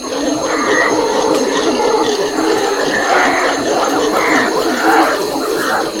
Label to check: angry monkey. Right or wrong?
Right